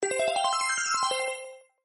petard

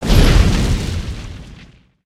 wind, battle

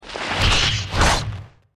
attack; hero

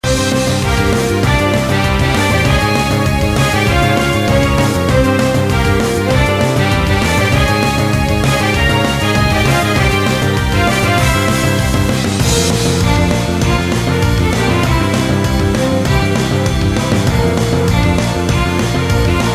battle pvp loop

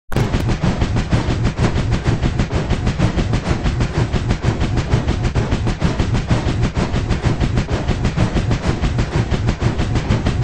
scroll

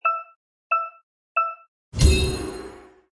arena countdown

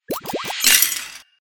break lock

